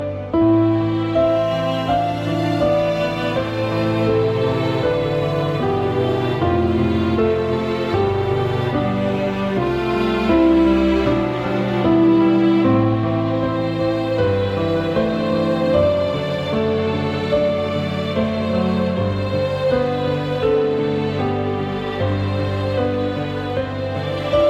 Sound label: sad mood, ringtones